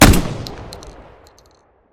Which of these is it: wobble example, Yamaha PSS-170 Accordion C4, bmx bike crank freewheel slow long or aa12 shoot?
aa12 shoot